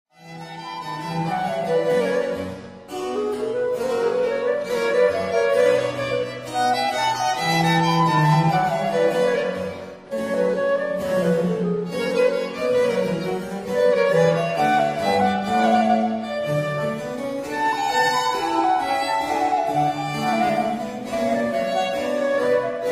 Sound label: for 2 violins & continuo, Gavotte; L'Espagnole (La visionnaire); Les Nations